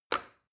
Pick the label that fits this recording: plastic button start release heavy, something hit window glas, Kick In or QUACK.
plastic button start release heavy